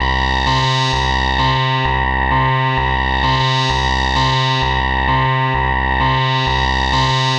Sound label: 130 C synth, Synth Loops, Trance